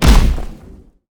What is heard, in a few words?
Bomb Explode Sound; Jolly Battle; Android